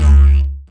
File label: Didgeridoo Kit Samples; Sonic Foundry (Sony Creative Software); The Electronic Music Manuscript Collection; Battery 3 Sample Kits